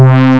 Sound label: uran flute; Amiga Soundtracker Sample Packs